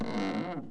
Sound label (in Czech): vrz; Mafie 1